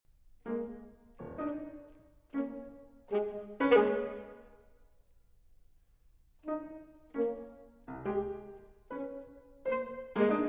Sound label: Sax